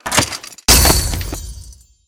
pad lock; netent; narcos

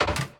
button General; netent; bloodsuckers

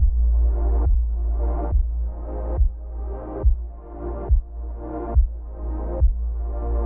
intro Free Spin Loop; netent; jewelfruits

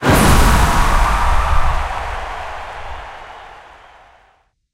medium Win; netent

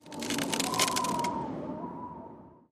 ice Shoot; netent; magicportals

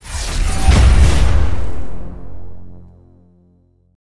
free Spin; netent; multipliermayhem

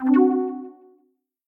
spin Button Click; netent; fruitshop

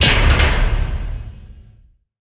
lock It Land; narcos

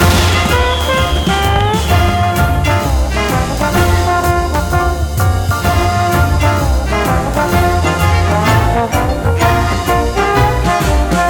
big win base; netent; copycats

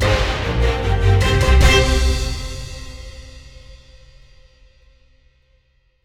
outro Free Spin; netent; fortunerangers

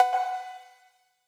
button Click; netent; neonstaxx

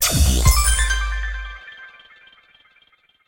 win Small; netent; doublestacks

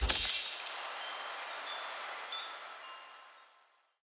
spin Button Click; butterflystaxx

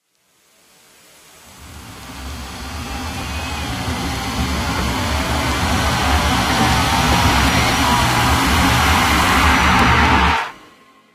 scatter wheel riser; netent

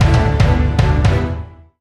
medium win; netent; piratefromtheeast

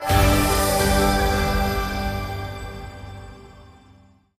large Win; netent; sweetyhoneyfruity

